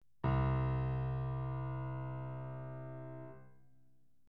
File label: C2 loud